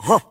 huh; Voice; ooh ahh

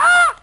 "ah", parrot; Voice; ooh ahh

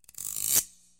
guiro

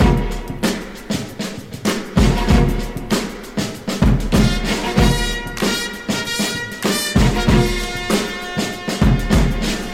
fanfare only; Drum loops

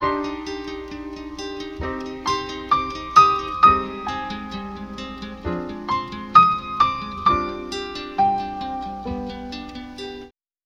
piano, Loops Sad

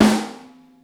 ring, snares real

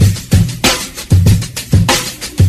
congos gone, Drum loops, Boombap

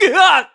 eeeyah male, voice; ooh ahh

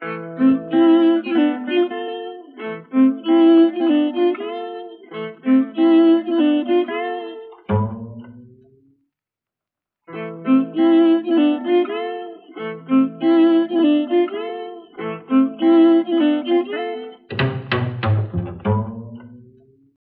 strings, Loops; Phonky

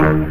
sax; Winds

